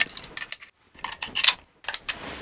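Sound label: infantry weapons foley; Red Orchestra Ostfront 41-45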